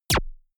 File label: gun shot silencer synthesized zap; schusswaffen